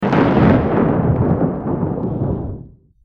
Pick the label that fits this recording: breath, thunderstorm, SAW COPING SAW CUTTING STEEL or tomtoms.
thunderstorm